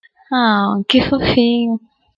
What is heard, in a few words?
auauauauaua